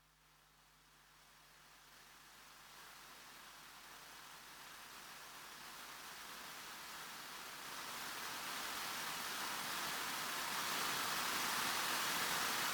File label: water running